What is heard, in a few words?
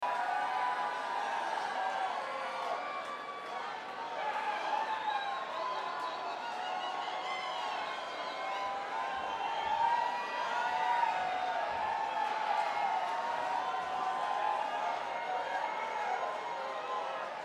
Crowd